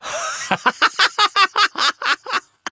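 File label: battle laugh; dqxi; erik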